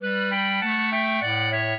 minuet, clarinet; Mozart

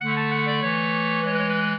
clarinet; Mozart

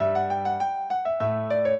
piano; Mozart

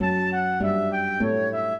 minuet, flute harp; Mozart

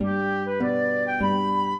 minuet, flute harp; Mozart

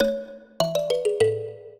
minuet, mbira; Mozart

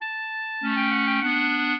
clarinet; Mozart

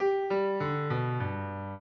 piano; Mozart

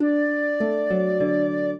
trio, flute harp; Mozart

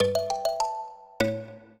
minuet, mbira; Mozart

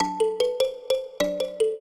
trio, mbira; Mozart

